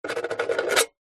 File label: office pen biro writing sign signature metal box